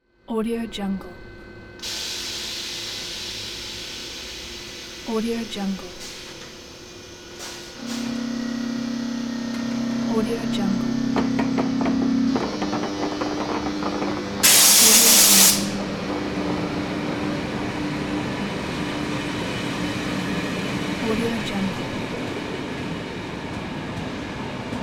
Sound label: train leaving station exterior